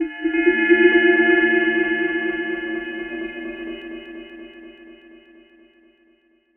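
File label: deep house FX, 125 bpm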